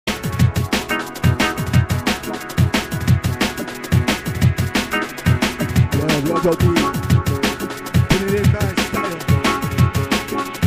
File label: Drum and Bass Jungle and Old Skool, UK Bass Radio